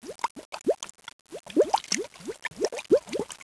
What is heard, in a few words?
underwater bubble; ambience; sadpuppy